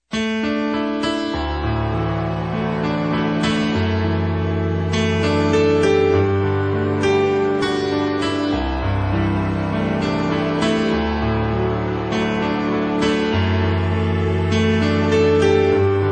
romantico

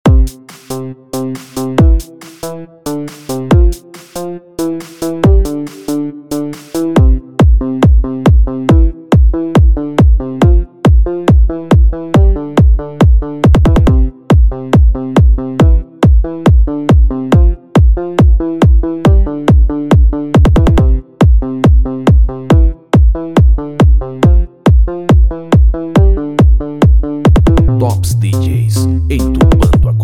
auto som pancadao automotivo

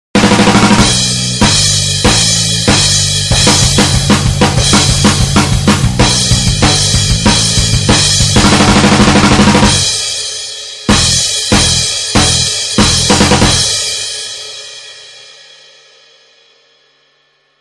baterista metal

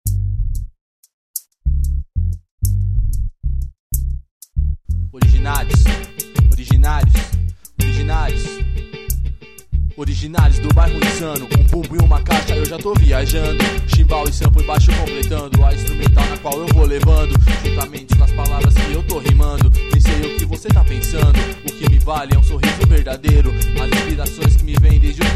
funk

